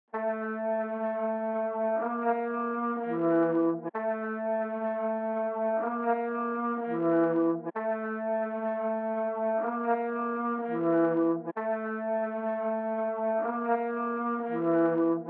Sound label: 126 BPM D Min Trumpet; Loop Stems; Cymatics; 2022 Melody Collection Platinum Expansion; Fusion